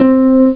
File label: HARP SHA, STRINGS